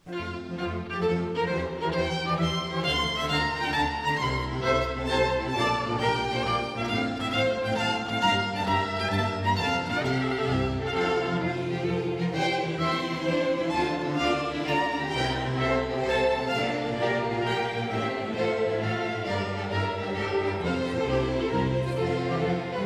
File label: mixing EQ